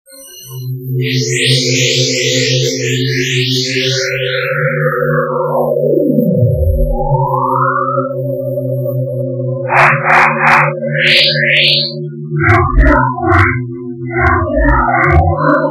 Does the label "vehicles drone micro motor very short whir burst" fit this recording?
No